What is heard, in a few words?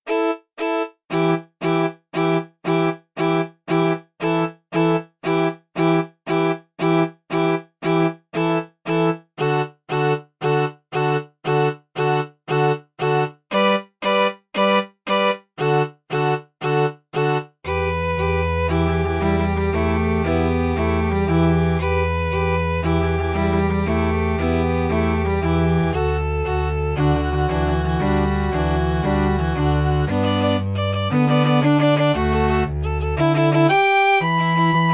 -, string quartet